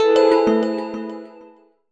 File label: Error; Linux